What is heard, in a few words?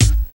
snappy kick g sharp key, Royality free kickdrum sample tuned to the G# note. Loudest frequency: 1453Hz